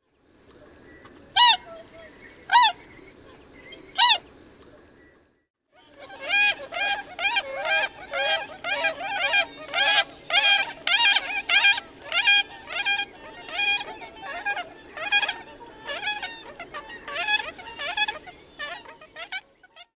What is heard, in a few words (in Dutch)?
Kleine zwaan